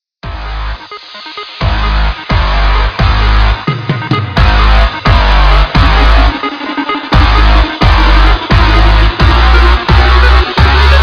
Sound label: music